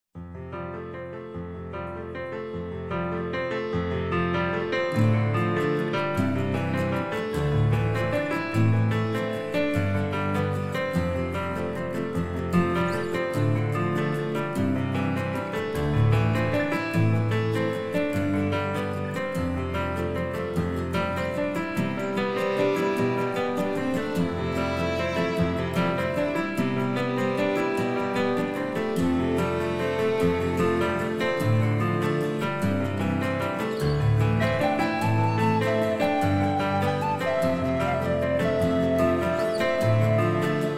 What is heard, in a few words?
Shire flute and piano